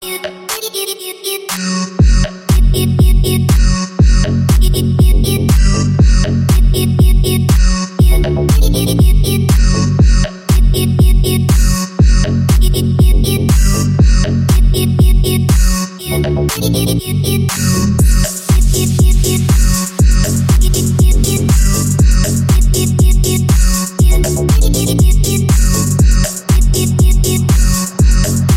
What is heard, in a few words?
Bass Boosted